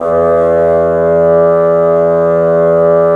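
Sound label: wind bassoon; LA Composer vol. 3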